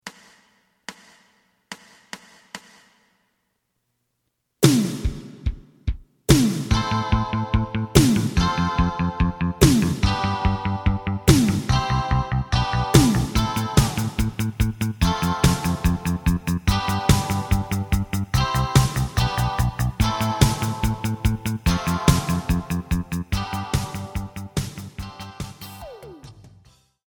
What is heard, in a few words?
lead